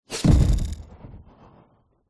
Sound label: sound design impact designed mid glitch; zapsplat